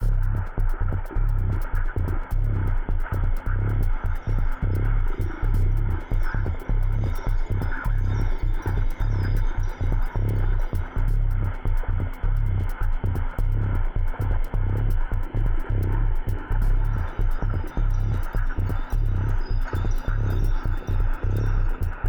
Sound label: BGM Serious; the letter a horror visual novel switch gamerip 2021